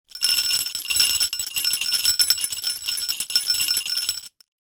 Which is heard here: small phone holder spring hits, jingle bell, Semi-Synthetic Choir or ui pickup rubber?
jingle bell